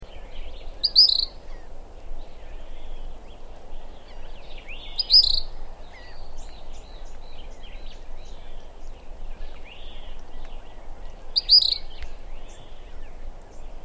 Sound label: grey kingbird; curacao 2015